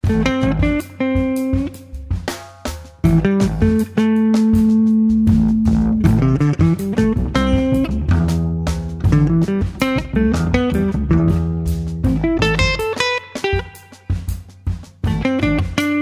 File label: Jazz Guitar; Ueberschall Jazz Guitar